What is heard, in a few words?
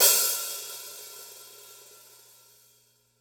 sizzly open high hat a sharp key